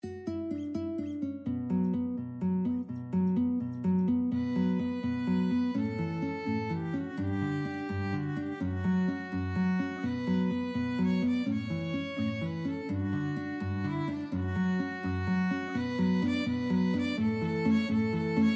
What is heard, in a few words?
spanish violin guitar duet